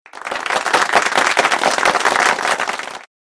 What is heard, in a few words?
Clapping